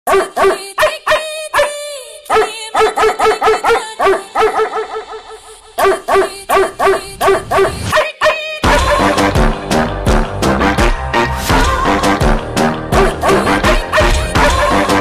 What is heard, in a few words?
bark